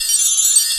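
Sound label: ambient pad, synthesizer; AKAI S6000 CD-ROM - Volume 1; DIGI PAD FX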